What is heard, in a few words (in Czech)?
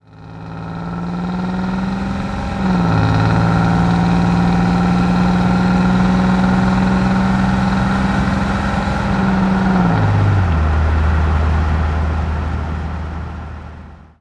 auto; Mafie 1